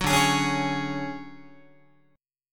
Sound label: D Major Sharp 11th, D, down, Guitar; strummed; JGuitar